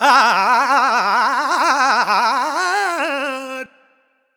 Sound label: Vocals Male World Pop; Sonic Foundry (Sony Creative Software)